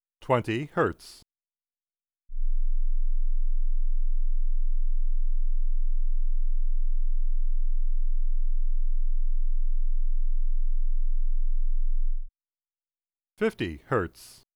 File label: Tone Freq Run